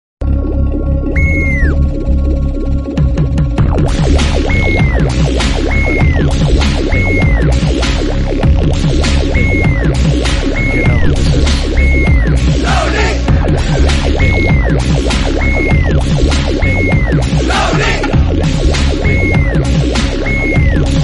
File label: ringtone music; vijay devarakonda; rowdy